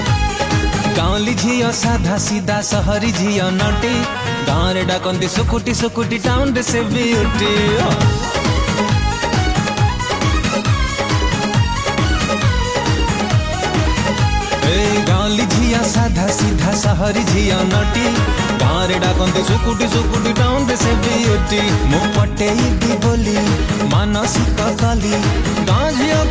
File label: New Odia Song